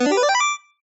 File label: Ball, Skee-Ball; TheStable; Pyralix